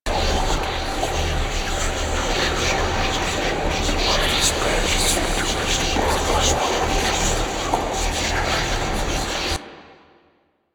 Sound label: Location Loop; Enemies; Warframe; Entrati Doppelganger